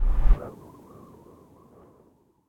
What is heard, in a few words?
rewind start